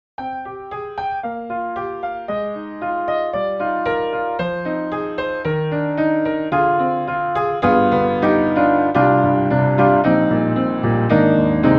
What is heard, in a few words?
1920 Uplight Piano; IK Multimedia; SampleTank2.5XL